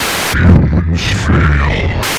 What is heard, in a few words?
Humans Fail